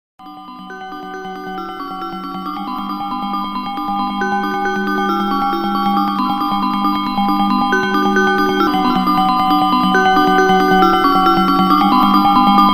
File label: The Bells Sound